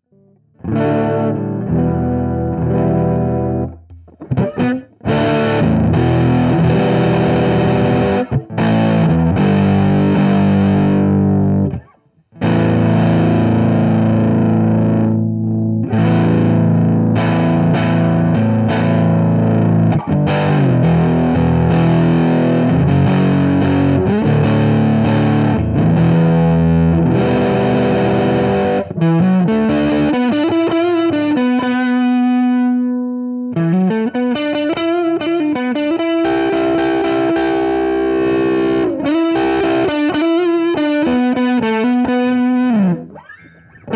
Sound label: dead slow fuzz